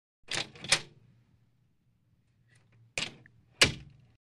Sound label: Wooden door opens, closes